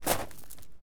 footsteps gravel

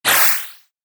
acceleration

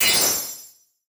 ice magic middle; 魔法効果音

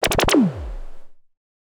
consecutive impact, mahjong; ボードゲームやカジノ、麻雀の効果音です

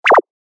cancel

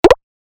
move

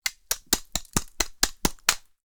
Crushing ice with an ice pick

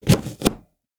Cut a lemon

